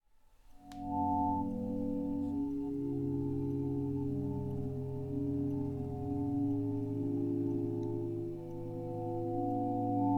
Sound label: Sci Fi Gravitational Field